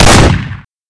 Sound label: Spas12 Fire